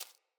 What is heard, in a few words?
Rat Step